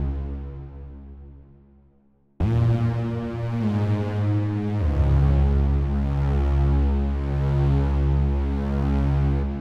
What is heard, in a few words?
Bass Layer